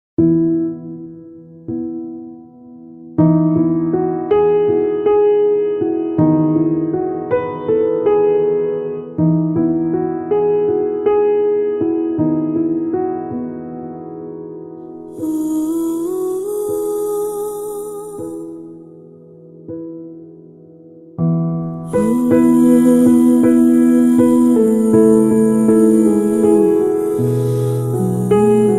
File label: heart broken bgm, sad ringtone, sad bgm; dear comrade movie; south movie